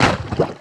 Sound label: Lava Splash; Destruction